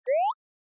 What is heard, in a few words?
Anime Motion Single